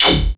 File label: Riddim Bass Shot; Dubstep; Riddim Basslines; Ghosthack; Advent Calendar 2019 Day 17; Riddim Bass Shots